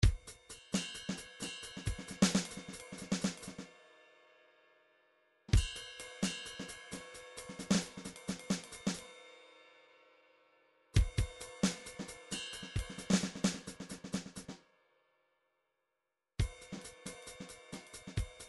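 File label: drums jazz pattern, midi; Paul Kodish Dangerous Drums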